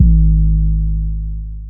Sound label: 808 Fade